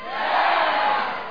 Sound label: SWELL; DECATHALON